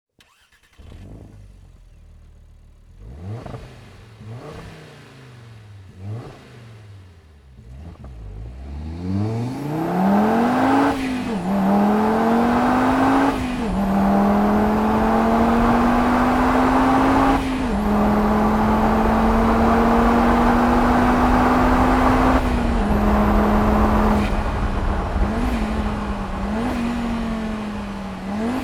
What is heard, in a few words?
Audi TT RS